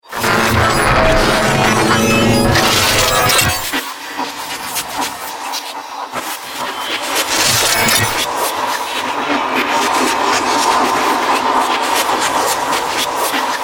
Dubstep Glitch Transition

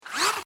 Servo Mechanical SciFi Robot Toy; Scifi Servo D Mechanical Robot Small Motor Toy; BBFX

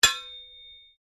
Strike Metal On Metal Heavy Ring

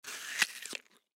Human Bite Food; Bite Food Watermelon Juicy Crunch; Eating; Sound Effects:Human

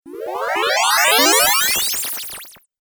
Teleport Warp Video Game

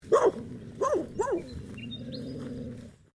ANIMAL DOG BLUE HEALER BARK GROWL; Various domestic dogs; Animals

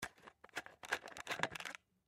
Spray Bottle, Spray Bottle Untwist Cap; Spraying

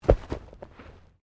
Body movement Impact Roll; Body movement foley to simulate falls and deaths; Rolling; Objects

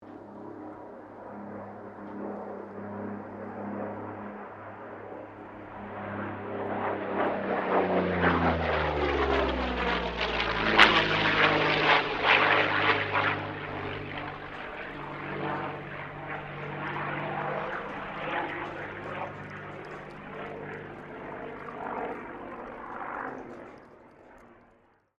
AIRCRAFT P-40 KITTYHAWK PASS STEREO; P-40 Kittyhawk / Warhawk / Tomahawk; Airplanes; Vehicles

